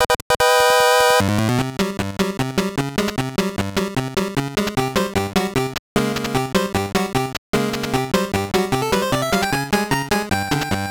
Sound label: Boss Fight; Wario Land III